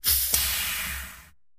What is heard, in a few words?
Hydraulic valves release air and steam. Valves, Release Air, Pressure Hiss, Steam